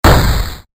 巨大ロボット 歩く 一歩; モノラル

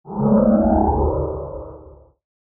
ダークマター

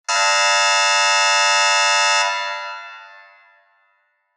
劇場やホールの開演ブザー 短め リバーブ有り; 劇場やホールの開演ブザーその１; 演劇・舞台

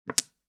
牌を置く; 場面切り替えや心理描写; 演出効果音

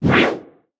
空振り; 武器効果音

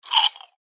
スナック菓子を食べる

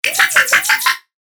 ロボの声

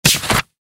ページめくり

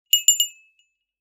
小さいベルの音; 場面切り替えや心理描写; 演出効果音

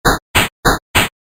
階段・移動 レトロ

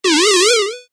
呪文を唱える; モノラル; 魔法効果音

